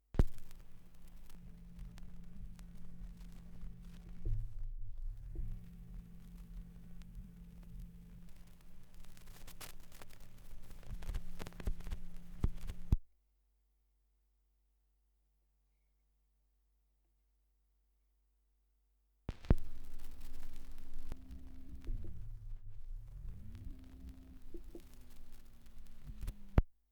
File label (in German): Schallplattengeraeusche 78rpm; wendelrekords 1.2